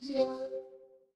feedback success

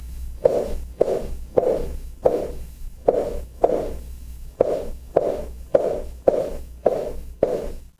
footsteps heels

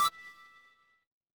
retro game coin

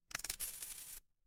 retro game coin

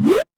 retro game jump

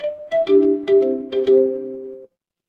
office ringtone

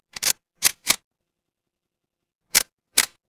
office camera

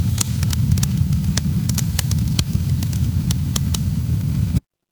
fire electric crackle

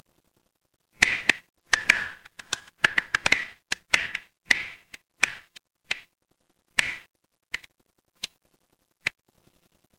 ambience fireplace

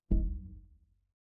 ui app open

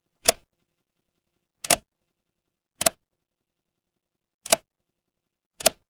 mechanical switch

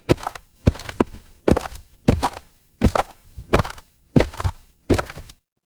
footsteps gravel

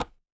impact wood knock

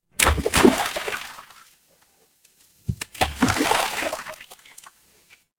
water splash

